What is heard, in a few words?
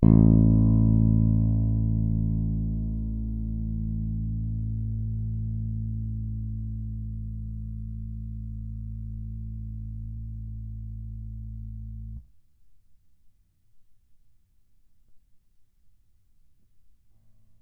fat beam finger, bass